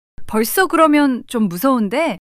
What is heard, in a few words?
surprise